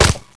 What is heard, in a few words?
shot